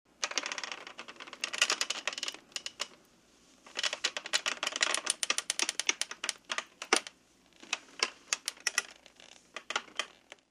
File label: wooden door creak